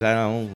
odd vocalization

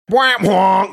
fail sound

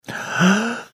gasping

